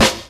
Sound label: sharp snare sound f key, Royality free acoustic snare sound tuned to the F note.